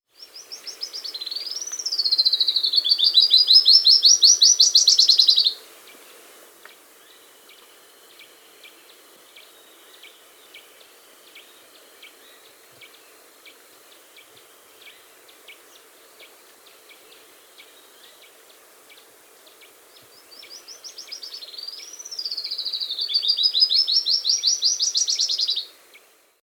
Three-Striped Warbler (Basileuterus Tristriatus), Andean Birds; S. America